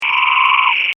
balvarius, frogs

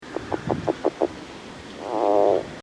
rdraytonii, frogs

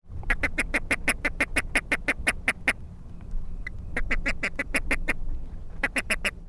bcognatus release

